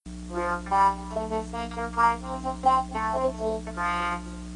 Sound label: synth